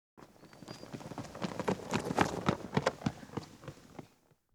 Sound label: one person running; Soccer; Butterfinger Video Sound Effects; Soccer Running Chainlink and Huh